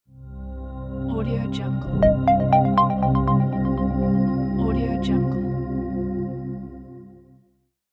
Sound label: ambient corporate logo